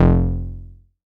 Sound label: bass F1, Korg MS-20; Polyend; Simulacrum Sounds; 1 Shots; Synths